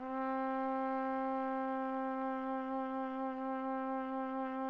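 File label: TPT, trumpet; Roland - LA Composer vol. 3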